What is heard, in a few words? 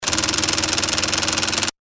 telegraph